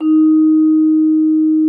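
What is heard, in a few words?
vibraphone D#4; Xsample VOL-4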